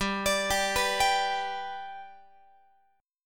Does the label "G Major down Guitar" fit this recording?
Yes